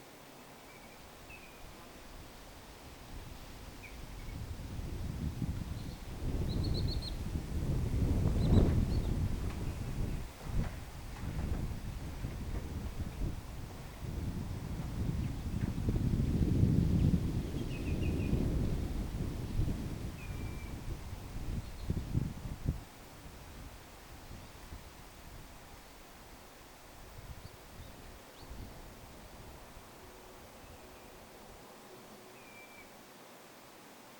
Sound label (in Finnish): onko tuo itäinen punajalkaviklo lirolintukin